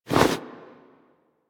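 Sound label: Holster Weapon; Foley; Warframe; Tenno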